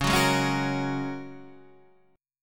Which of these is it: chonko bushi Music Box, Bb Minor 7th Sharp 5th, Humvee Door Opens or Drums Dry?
Bb Minor 7th Sharp 5th